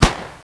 shoot, paintball

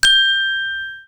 glass; openspace3d; kinectdemo

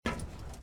step metal; openspace3d; fps navigation

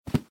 step default; openspace3d; fps navigation

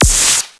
hurt; paintballav

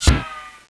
hurt; paintball

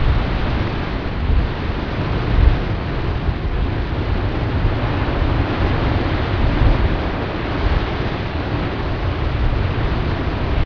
geyseir; openspace3d; aliencrash

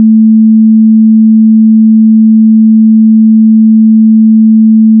1k Sine 16; wiimote; wiiyourself

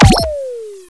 shoot, paintball; paintballav

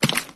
splash; openspace3d; vrcells

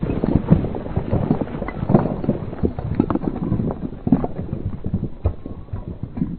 rocks collapse; openspace3d; aliencrash

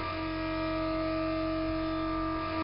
motor; openspace3d; FPSDemo

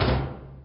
shock; openspace3d; FPSDemo

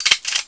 reload, paintball; paintballav

